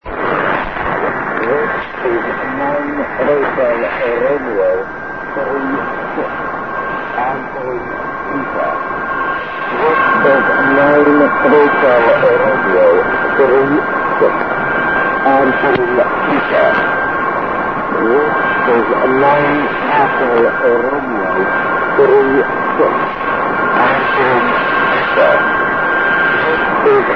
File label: malta usb